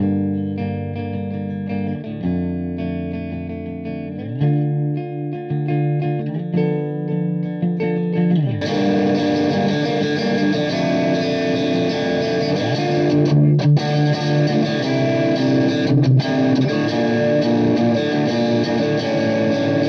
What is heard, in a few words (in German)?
Verzerrer Pedale High Gain; Morning Glory